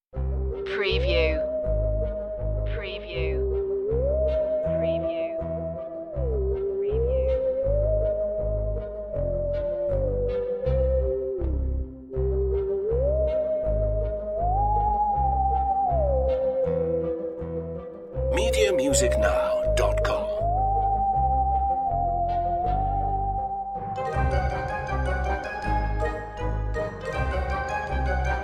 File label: spooky adventure no strings